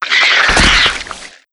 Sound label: Spider Die; Wild West Shootout (Arcade) (gamerip) (2019)